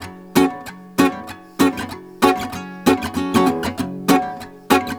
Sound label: GUITAR, Balkanish Traditions